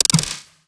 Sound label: perc; Anthemic.Electro; drum hits